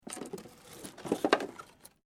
WOOD PLANK MOVEMENT

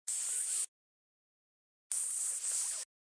High Hiss, High hissing sound.; Hisses

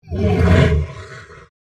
Monster Grunt, Horror, Monster, Grunt, Creature

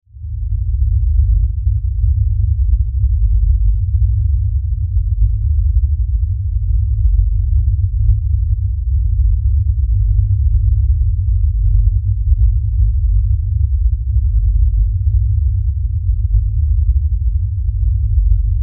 Lfe Drone Low Bass Rumble; Drones; Blastwave

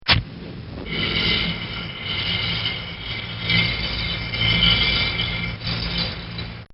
Cartoon Sound Effects Grinding; Cartoon Sound Design

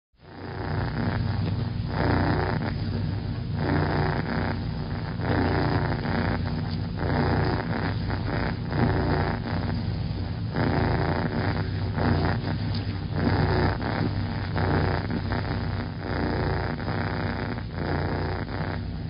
Purr, Cat; Cats; Animals; recorded in a custom built sound booth; Bobby Cole

